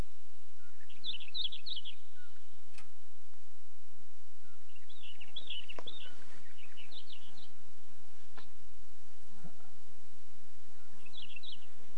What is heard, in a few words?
Hume's wheatear